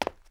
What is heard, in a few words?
FOOTSTEP CONCRETE